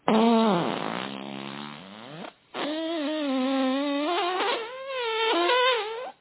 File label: farts